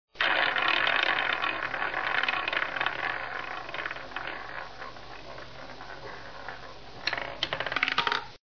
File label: roulette spin